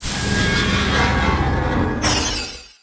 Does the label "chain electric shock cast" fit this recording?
Yes